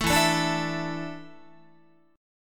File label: E 7th Suspended 4th, Guitar; E7sus4; strummed